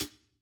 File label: hihat, house